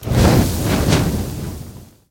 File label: fire burst; netent; narcos